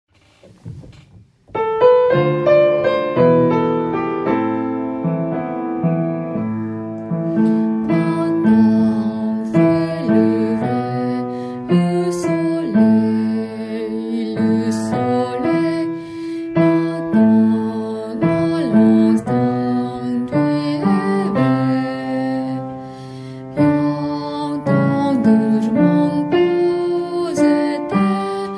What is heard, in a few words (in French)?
basse